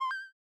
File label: switch